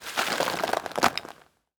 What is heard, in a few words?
wood bark fall on concrete; matter mayhem; soundmorph